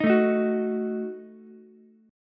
Eb Diminished, Ukulele; Ebdim strummed; JGuitar

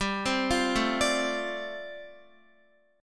G 7th Suspended 2nd Suspended 4th, Guitar

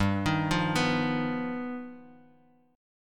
G Augmented 7th, Guitar Open D

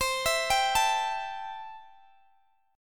C 6th, C, down, Guitar, Open G

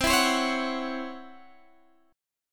Db Minor Major 11th C

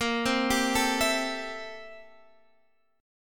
A-Minor 7th Bb; Am7/Bb; arpeggiated

